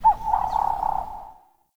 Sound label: Forest Open Glade Animal Far, Day